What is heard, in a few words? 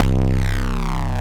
C Bass, DnB One Shots